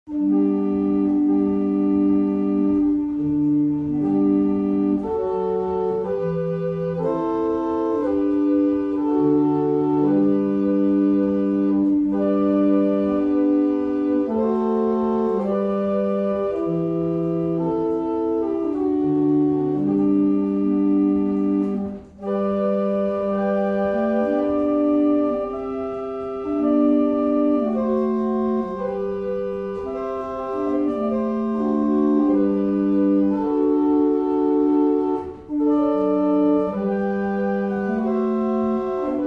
Irish, barrel organs; Cardeston

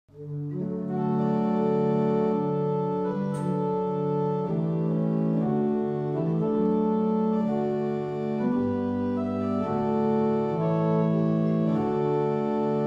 barrel organs; Fobbing

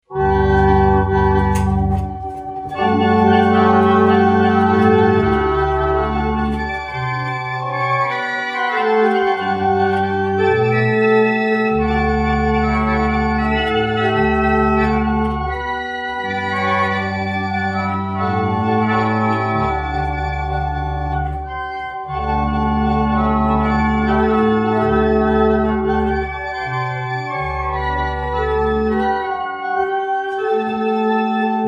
Chant, barrel organs; Huttoft

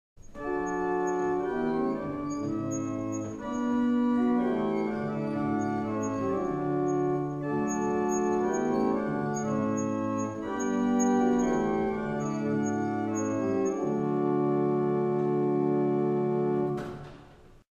Double chant, barrel organs; Wissington

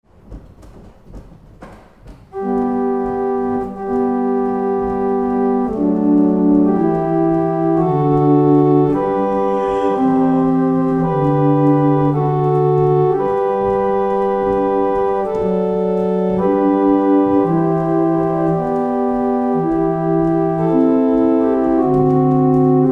barrel organ; Letheringsett

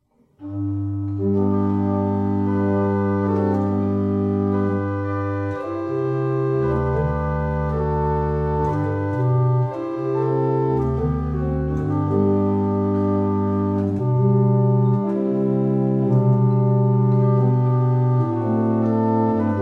Abingdon, barrel organs; Staunton

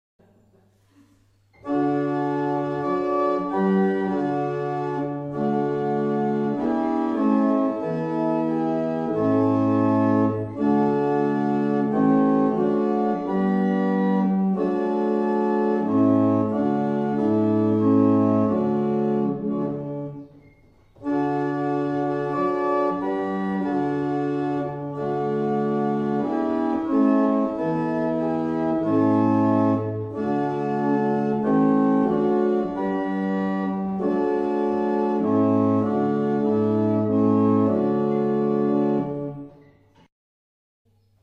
Double chant, barrel organ; Mattishall

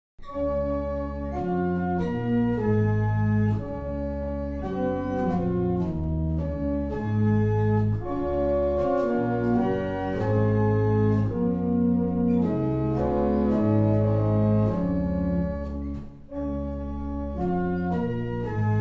Double chant, barrel organ; Bressingham

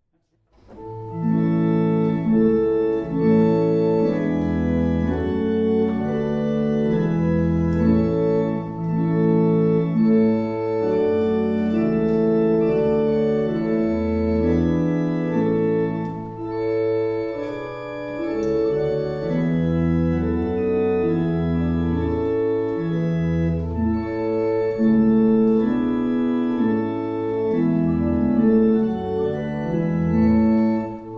barrel organ; Lopham

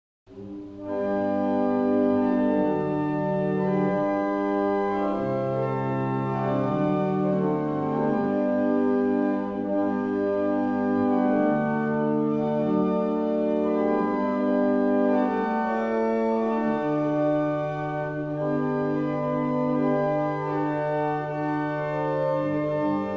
Shirland, barrel organs; Avington

